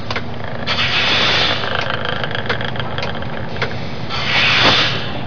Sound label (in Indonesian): suara mobil